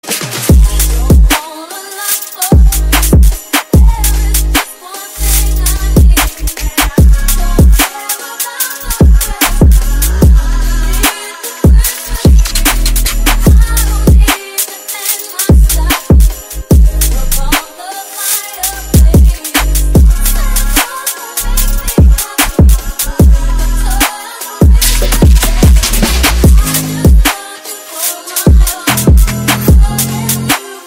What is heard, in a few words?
drill remix